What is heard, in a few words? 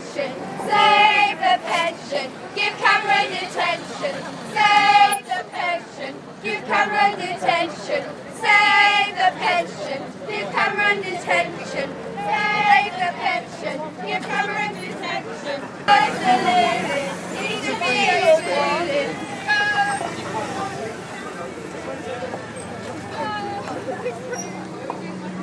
chanting at nut strike